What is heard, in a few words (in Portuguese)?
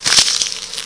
papel; 7invasores